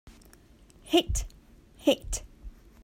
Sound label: Hit